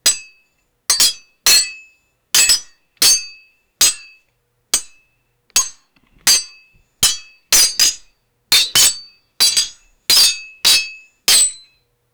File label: clashing swords in a duel